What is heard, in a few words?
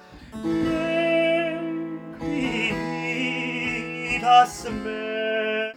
solo, Esclamazione, trillo